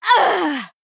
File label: female high elf death